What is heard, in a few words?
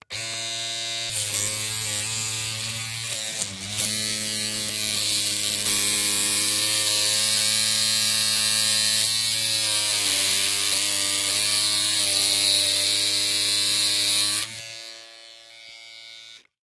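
sounds of hair clipper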